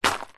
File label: foley footstep single stony gravel road; zapsplat